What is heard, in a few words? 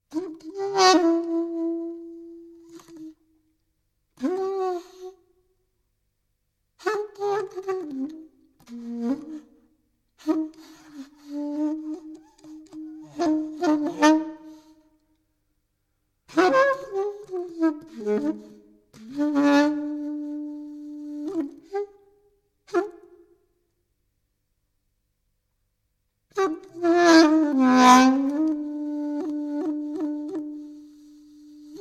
scales